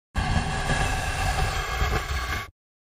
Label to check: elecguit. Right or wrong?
Wrong